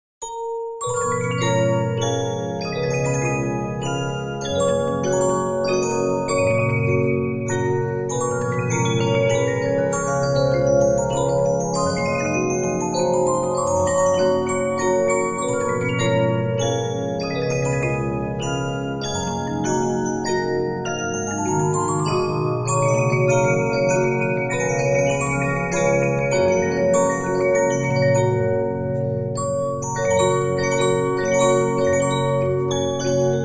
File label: Regina, 15inch disc musicbox